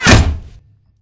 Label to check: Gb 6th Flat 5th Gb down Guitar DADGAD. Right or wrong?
Wrong